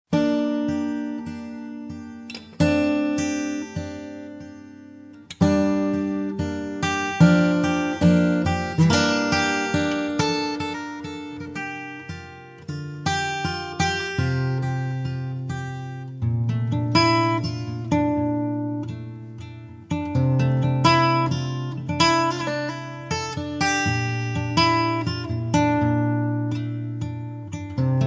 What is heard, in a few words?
Acoustic Track